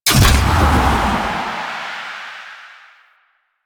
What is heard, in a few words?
Void Dragon Jump Off; Enemies; Warframe; Duviri